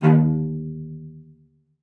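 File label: cello spiccato D#5, cello; Xsample VOL-5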